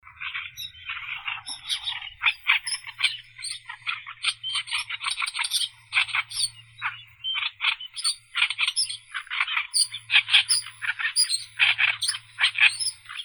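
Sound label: oriental reed warbler, acrocephalus orientalis; kamishovka drozdovidnaya vostochnaya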